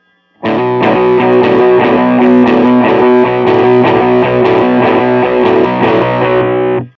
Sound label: disco delay